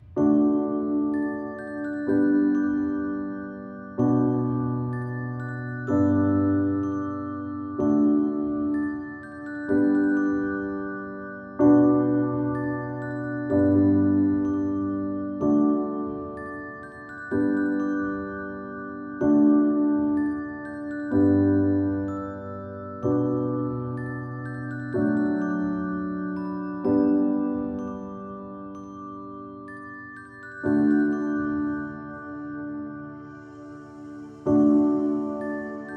Relaxing Piano Music, Nature Sounds

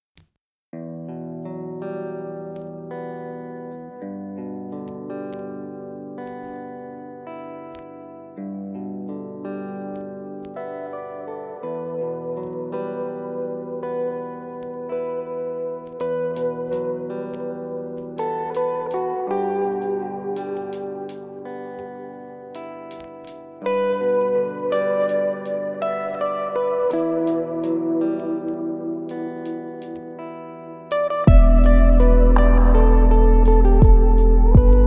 instrumental lofi

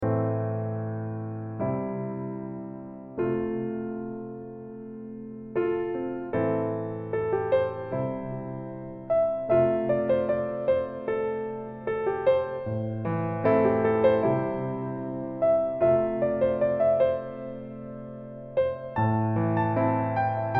Piano

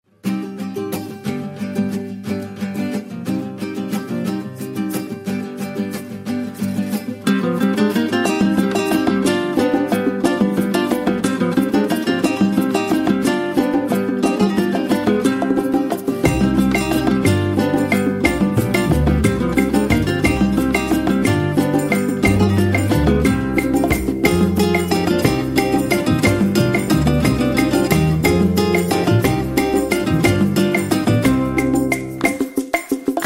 Chill Guitar

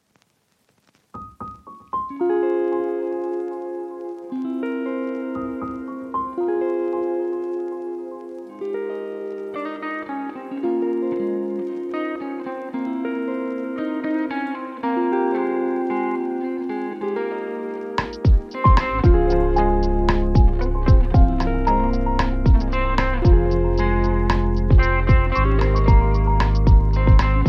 instrumental lofi

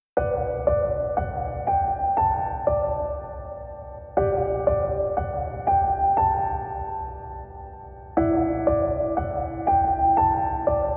Piano Relaxation, Guitarra Cuerda Piano Ambiente

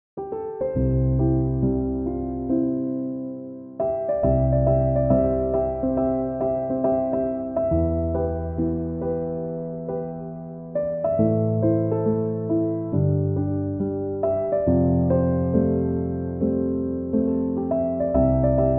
relaxing piano instrumental

